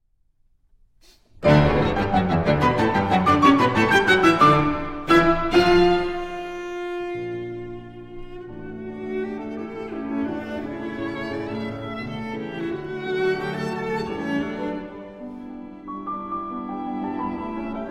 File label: Piano Trio No. 5 in D Major I. Allegro Vivace e Con Brio; Op. 70 No. 1 Ghost